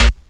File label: 90s solid hip hop steel snare drum sample a key